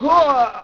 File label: bail vox; skater; Tony Hawk's Project 8; XBOX